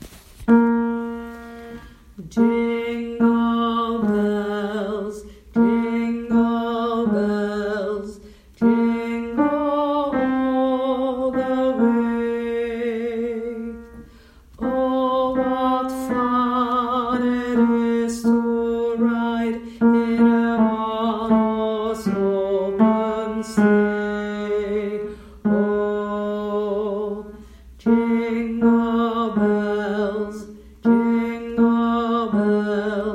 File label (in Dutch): Bas